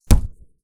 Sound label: orange hit hard; 磕头